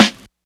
sharp snare drum sound g sharp key; snare drum samples

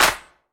clap one shot A key; percussion; single hits one shots

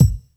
smooth steel kick drum sample a sharp key

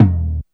tom drum single hit f key; tom drum samples

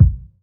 clear kick one shot B key; Duration 0:01

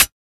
soft closed hi hat sample e key

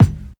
smooth bottom end kick drum one shot g sharp key

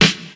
sparkle snare e key

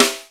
perfect acoustic snare sound c key

